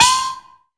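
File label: chinese cymbal high; AKAI S-Series CD-ROM Sound Library VOL-5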